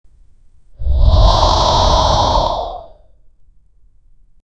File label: dragon using its fire bre